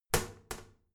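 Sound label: toilet lid wall open heavy, bathroom; haus-garten; badezimmer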